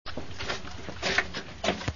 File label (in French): texture escaliers